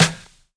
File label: short reverb acoustic snare e key, Royality free steel snare drum tuned to the E note; snare drum samples